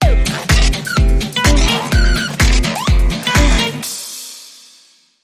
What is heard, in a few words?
Sting